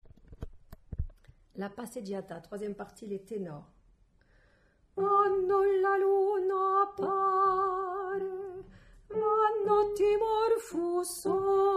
Tenor

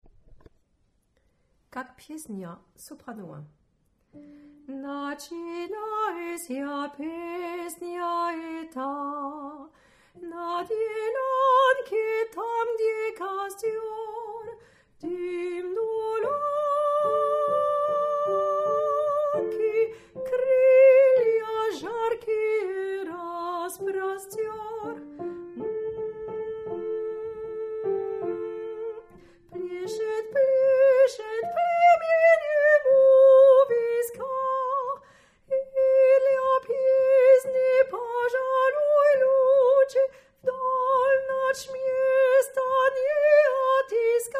Soprano

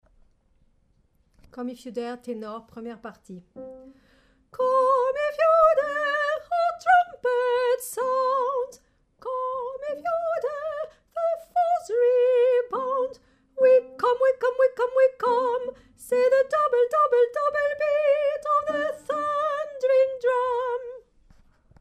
Tenor

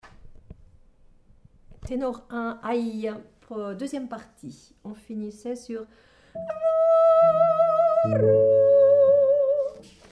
Tenor

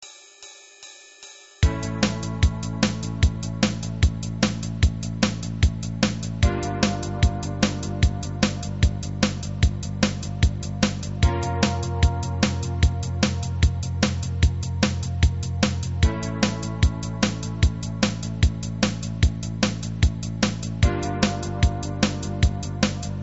sweep 150

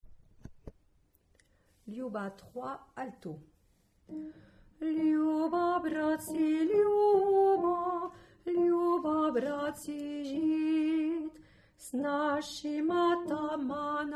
Alto

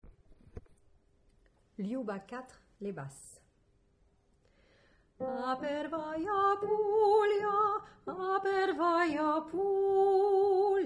Bass

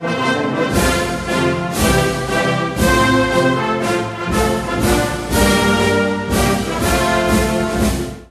Marseillaise instrumental phrase

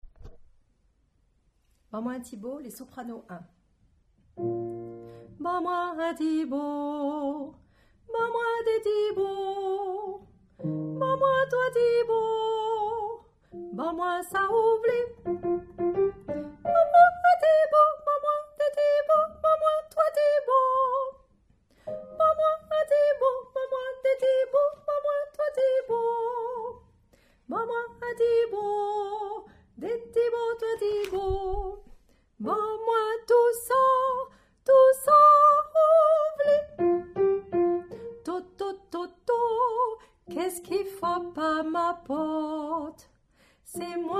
Soprano

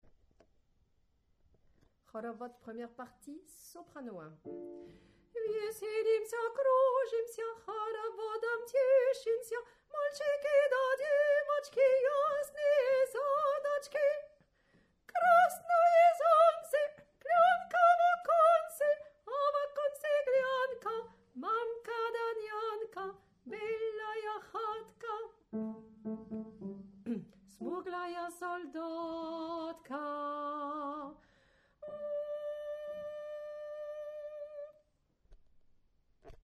Soprano; Haravod

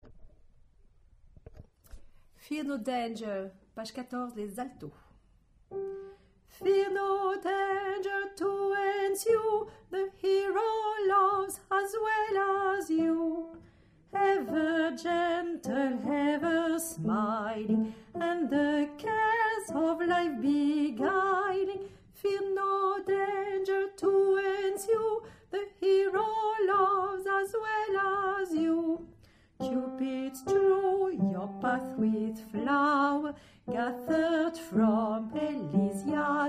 Alto

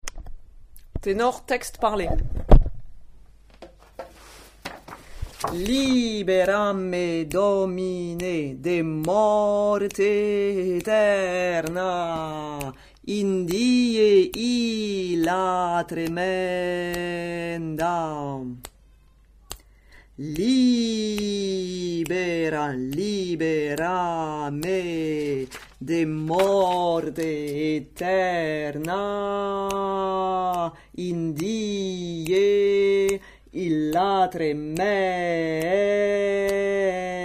tenor Texte; libera me

